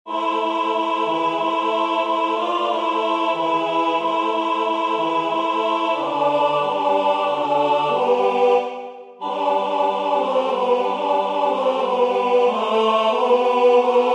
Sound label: Tenor; A.Dvorak; Slavikovsky Polecko Maly